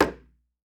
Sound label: G, talking drum; Best Service ProSamples vol.55 - Retro Sampler; AKAI